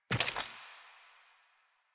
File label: Steps